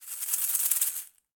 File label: Caxixi FX; Shaker